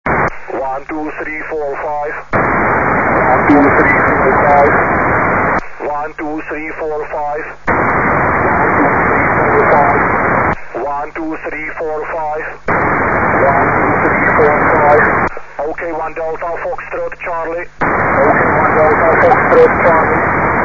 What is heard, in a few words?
echo; ssb